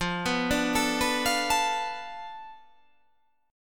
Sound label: F 6th Flat 5th F down, chord